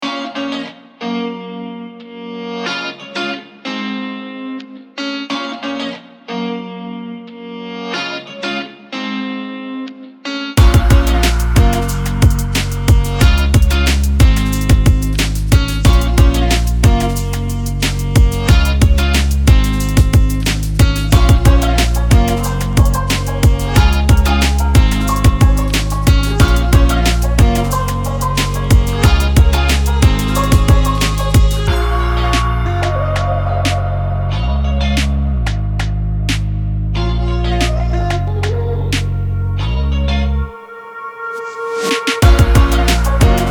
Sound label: Pop Beat Commercial type